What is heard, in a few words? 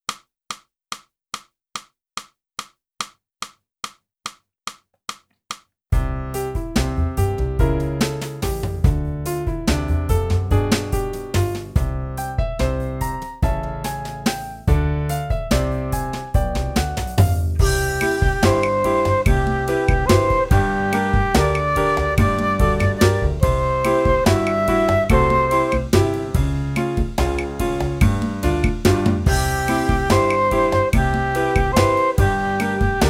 flute; boosey